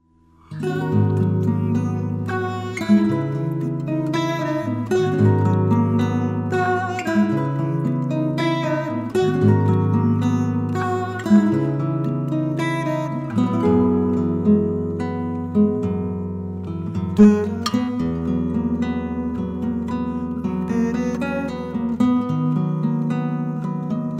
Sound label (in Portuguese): tom chuva